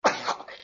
Gag; Lewd SFX

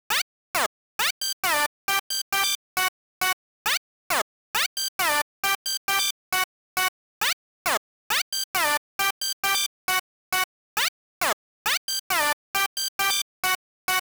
Chirpy jumpy thing, 135bpm

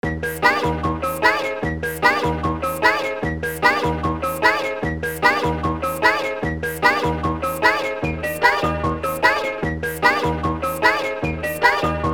TRAP

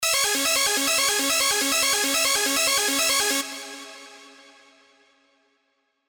Light Pluck BG

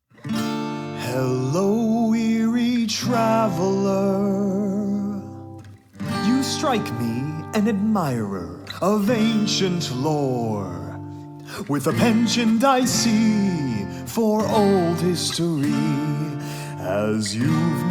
Symphonic Metal Orchestra

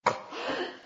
Cough; Lewd SFX

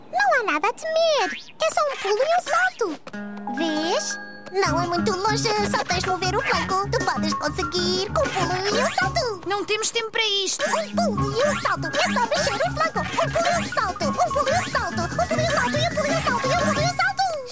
European Portuguese; Season 1

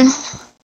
Moan

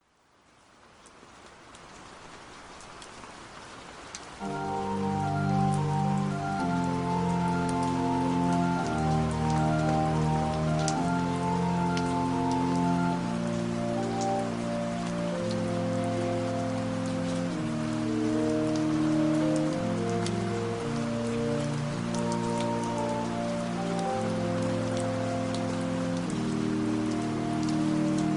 Pipe Organ Cover

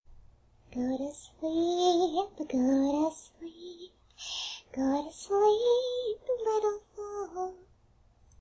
Foal lullaby; Fluttershy

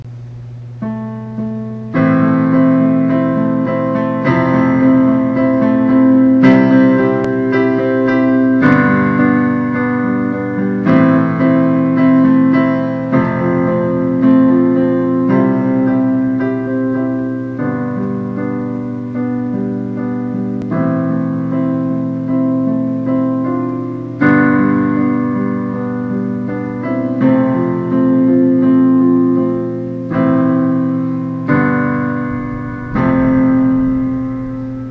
A simple piano song; Archangel Productions; Pony Music Archive

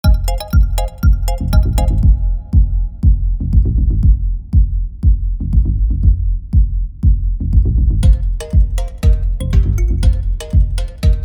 jungle beat

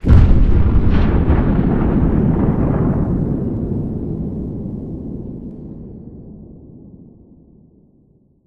Explosion Crash; War Ambience; Prydwen

